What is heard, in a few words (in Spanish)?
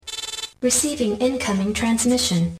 Transmision; Transmision 2 Gold